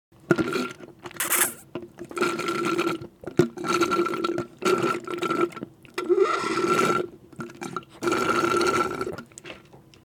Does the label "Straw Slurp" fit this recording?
Yes